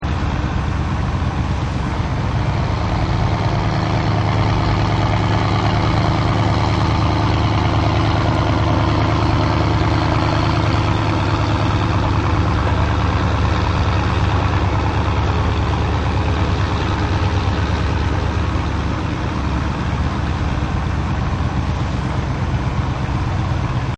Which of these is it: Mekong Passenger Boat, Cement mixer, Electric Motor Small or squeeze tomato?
Mekong Passenger Boat